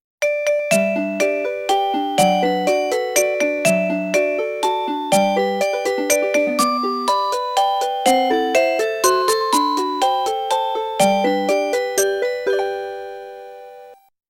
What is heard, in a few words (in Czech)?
melodie